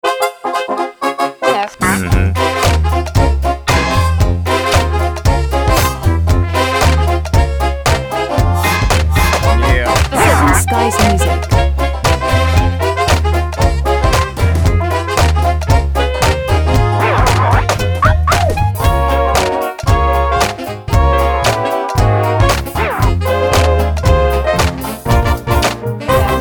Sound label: Retro hip hop